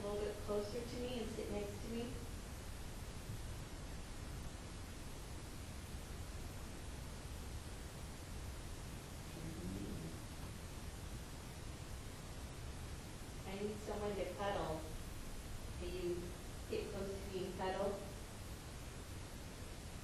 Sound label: Strange Noise